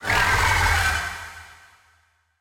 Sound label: Behemoth Cry